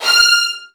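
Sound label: violin; Virtuoso Strings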